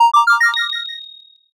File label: heal